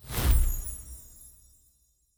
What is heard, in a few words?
Positive Effect; Fantasy Interface; Fantasy Sounds Bundle